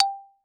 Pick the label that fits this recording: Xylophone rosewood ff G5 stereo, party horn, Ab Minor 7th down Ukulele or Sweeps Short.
Xylophone rosewood ff G5 stereo